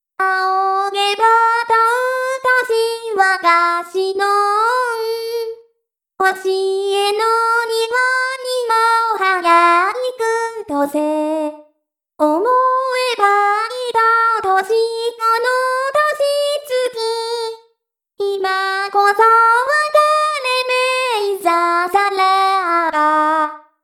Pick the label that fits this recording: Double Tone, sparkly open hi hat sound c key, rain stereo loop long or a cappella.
a cappella